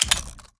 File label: main menu press settings; ui